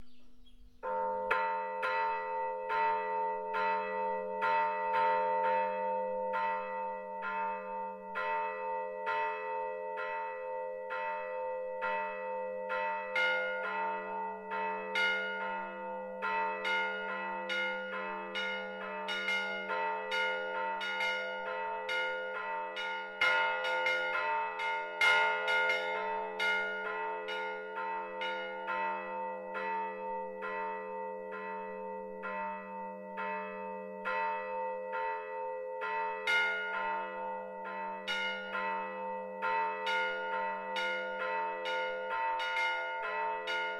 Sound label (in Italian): SUONATA PER I DEFUNTI, campane; Le campane del campanile di Santa Maria del Piano